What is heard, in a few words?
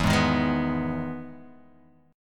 E Major 7th E down Guitar Open G